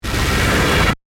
Hit Digital Warble; Hit Sound Effects; BlastWave

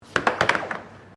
Air Hockey Hit Multi, Air Hockey table; Air Hockey (Sound Effects:Games)

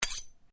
Blade Swish, Sword

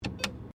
ATM Button Press, Automated teller machine; Machinery; Machines

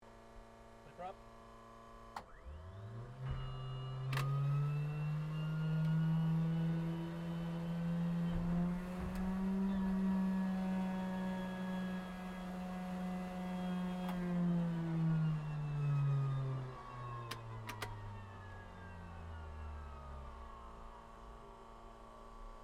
AIRCRAFT CA-13 BOOMERANG STARTUP INTERIOR; Airplanes (Sound Effects:Vehicles)

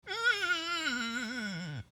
Finger Squeak, Finger Squeak On Ceramic Plate; Wet Finger Squeak On Ceramic Plate , Medium Speed; Squeaks; Objects

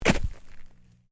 FOOTSTEPS SNEAKERS SHALLOW SNOW JUMP STEREO; Walking in sneakers or runners in light snow

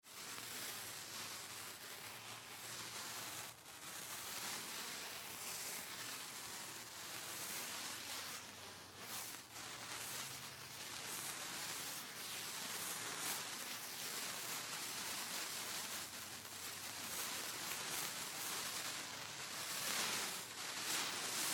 Fire Sparkler Burns Sizzle Bys Moves Distant; Fourth of July

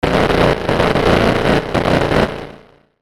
Electrical Fuzz Crackle; Production Element Imaging Element Accent Transition

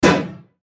Flash Animation Electronic Descend; Flash Animation High Pitch Whistle Electronic Descend; Warped Sound Effects

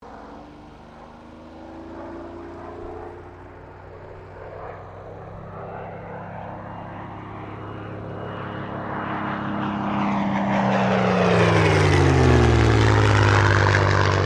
AIRCRAFT SUPERMARINE SPITFIRE MK-XVI PASS

